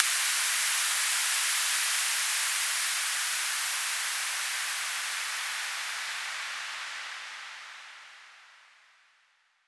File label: exhaust; osu